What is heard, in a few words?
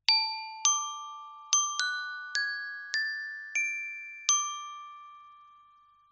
chimes